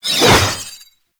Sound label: Sword Whoosh; Warframe; Sentient; Stalker Sentient Sword